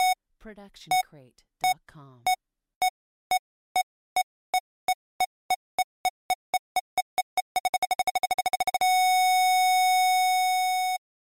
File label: Bomb Beeps Increasing